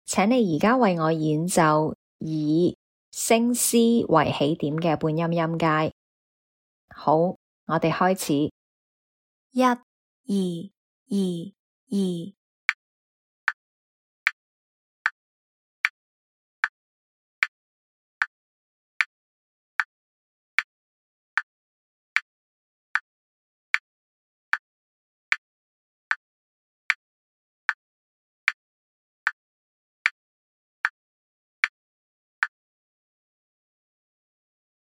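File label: chromatic scale starting C sharp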